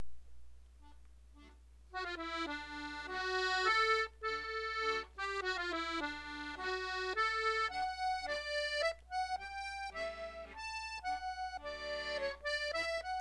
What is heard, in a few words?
country waltz